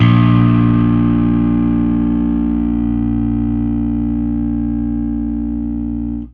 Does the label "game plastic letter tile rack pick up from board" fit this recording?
No